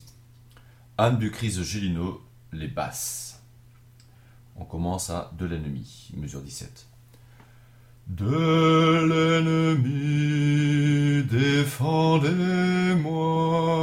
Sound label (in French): Basse; Gelineau; Ame du Christ